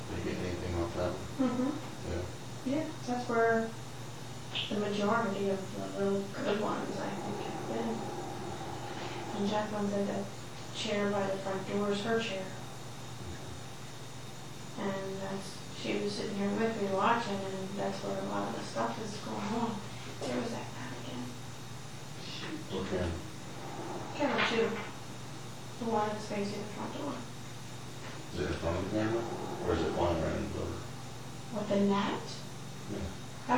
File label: heavy breathing; evps